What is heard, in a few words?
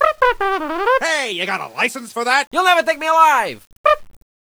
toot; garrysmod; taunts; props custom